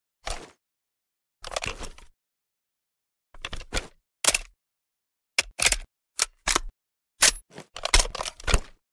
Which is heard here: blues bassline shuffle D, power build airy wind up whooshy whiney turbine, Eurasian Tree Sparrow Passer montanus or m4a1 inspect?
m4a1 inspect